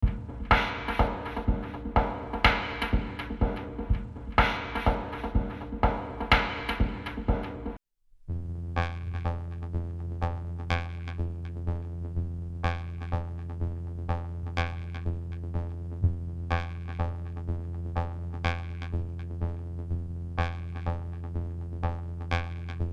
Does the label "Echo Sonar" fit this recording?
No